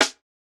snare, snare house

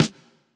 hiphop snare, Effect on

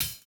hihat, house

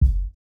kick, house

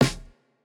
Jrock snare

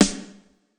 pop snare

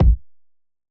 Hiphop kick; Reverb+EQ+Comp+Limit

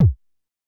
Korg kick

